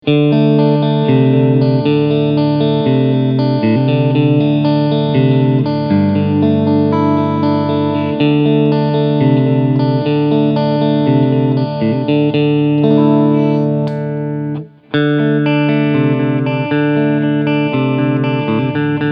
AZ 2204 Beide SCs Ohne und MIT Alter Switch; Ibanez

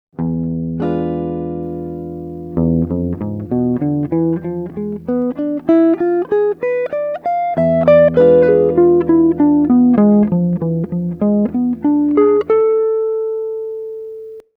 E7b9 Linie

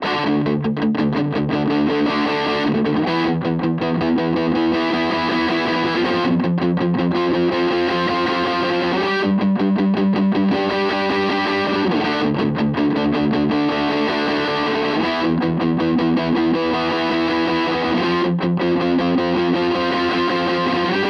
Maybach Harley Benton Vergleich Maybach Zerre

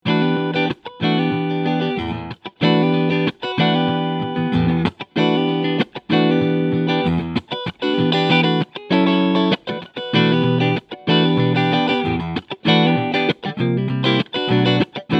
PRS Clean Strumming Schalterposition 2

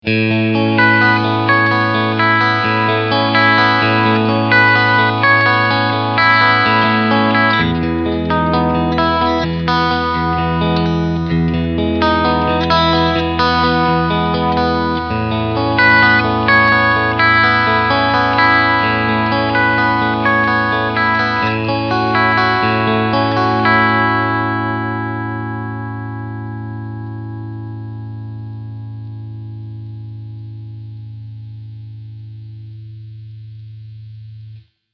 Middle SC und Steg SC Clean

